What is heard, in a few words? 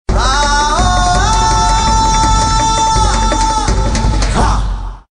WIN MAX; Flame Dancer